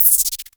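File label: Klone Dual Percussion Synthesiser LFO noise; KLONE FX NW8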